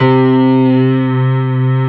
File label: REV PNO C2; NEW AGE